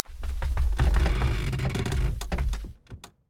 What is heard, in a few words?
Crawl Under Bed